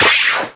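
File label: impact bullet generic titan shield; Titanfall 2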